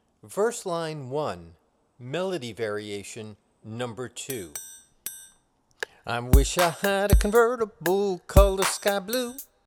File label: Verse Line Melody Variation